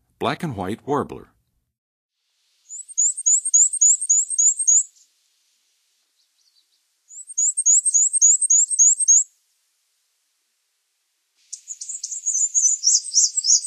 Black-and-White Warbler, Songs of Birds of North America; Western USA; Western USA Songbirds